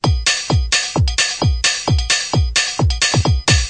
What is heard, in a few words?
131 bpm, electro compressed, drum loops and breaks